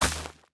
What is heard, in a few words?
lightning bug zap